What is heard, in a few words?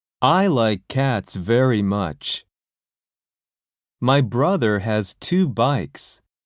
harmony; 新課程英語教科書 指導用音声CD